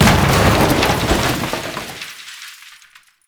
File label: Desk Destroy; Ambience; Gameplay; Warframe; Entrati